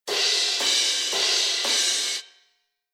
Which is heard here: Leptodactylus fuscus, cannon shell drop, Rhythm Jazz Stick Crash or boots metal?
Rhythm Jazz Stick Crash